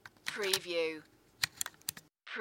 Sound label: Foley, Handling Small Plastic Parts